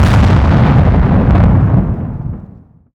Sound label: EXPLOSION Distorted Long stereo